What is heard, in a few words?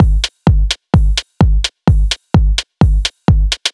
Loop, 128 BPM